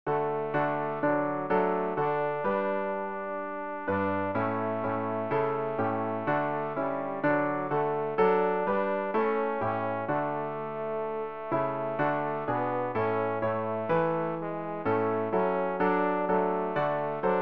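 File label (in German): tenor, Übehilfen für das Erlernen von Liedern; ref gesangbuch; rg 239 gelobet sei der herr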